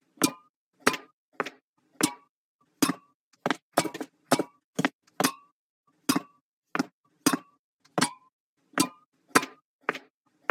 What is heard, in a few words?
alley walk; Pitch Black - A Detective's Demise